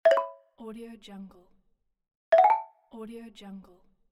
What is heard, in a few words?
sms